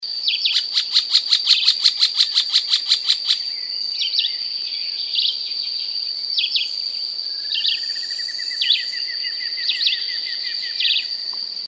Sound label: dot fronted woodpecker